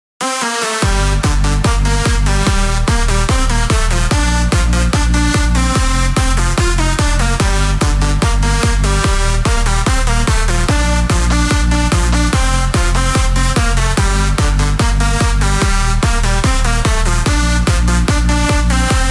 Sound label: ravers fantasy edm